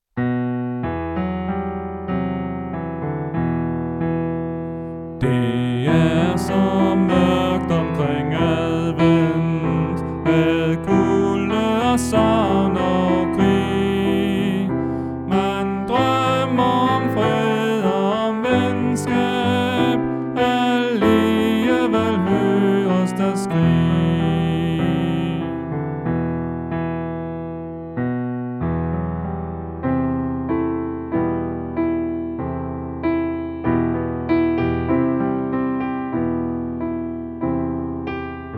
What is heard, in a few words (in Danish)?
Bas; oevefiler